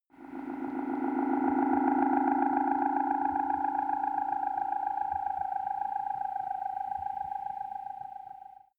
space passing small droid

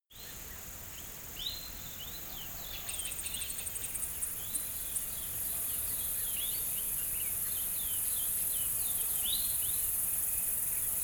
savanna summer afternoon

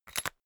umbrella telescopic retract fast

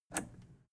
plastic button scratch release disable

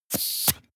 vent air release short

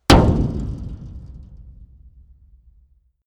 metal impact tank hard

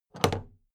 big plastic case handle bounce heavy

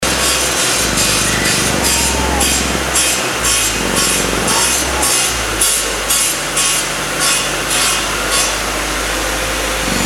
jackhammer

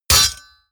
sword hit metal

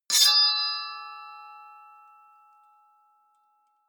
sword wield ringing

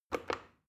plastic button start click regular fast

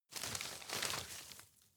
grab medium

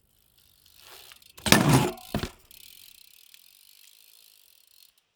bmx maneuver arrive grind drive off

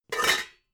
pots clank

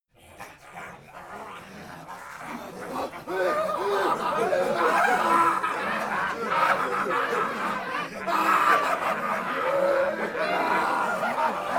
monster walla crazy

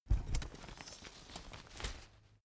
umbrella big close full soft

